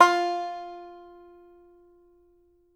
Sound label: banjo F4, banjo notes, country; Sampleheads; Whole Lotta Country 1 & 2